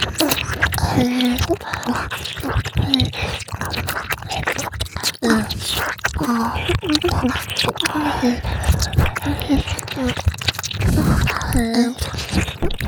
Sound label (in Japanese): 倍速耳舐めループ